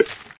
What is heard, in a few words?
Pop